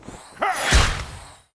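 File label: ghost captain attack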